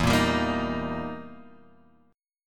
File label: E Minor Major 7th Flat 5th, E, down, Guitar, Open G